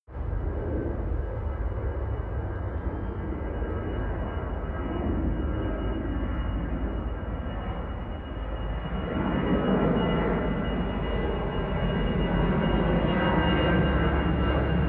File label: plane flyby land tonal